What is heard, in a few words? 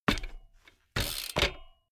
bmx bike pedal grip freewheel